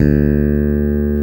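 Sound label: 5 string P bass; Roland LCDP02 Guitar and Bass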